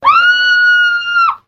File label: scream